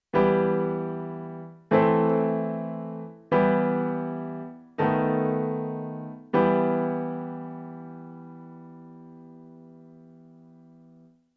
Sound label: E minor chord progress